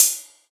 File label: hi hat g key, Royality free high-hat tuned to the G note. Loudest frequency: 8265Hz; drum single hits one shots